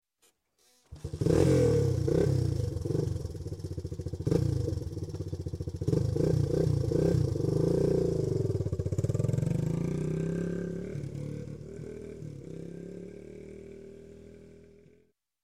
Motorcycle, Atc Pass By, Slow; Sound Ideas; Series 2000

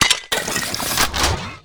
Gun Reload Scifi, Weapons Foley; SFX Kit

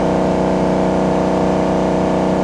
Truck Ford 250 Loop; Trucks

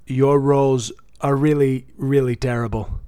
bad me; Dice World

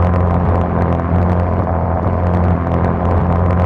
Auto Tires Cobblestone Loop; Automobiles

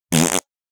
Fart Foley - Surprise - Medium Healthy Rip; Hollywood Edge; sound designer's toolkit v2

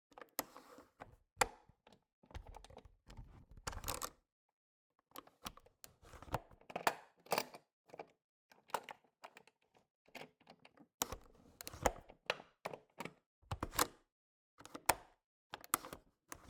Cabin, window, latch, flip, lock, fiddling, wide; Eiravaein Works; Vaeyan IV

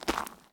zombie footstep; DeadFright

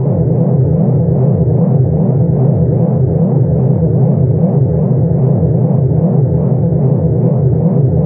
sci-fi forcefield hum loop; Space Wave Race

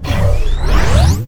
Scifi Dome Shield Activate; Energy & Forcefields

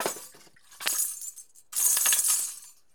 Percussion Native Shaker; SFX Kit; Foley - Percussion

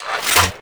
Gun Draw Select Weapon, Weapons Foley; SFX Kit

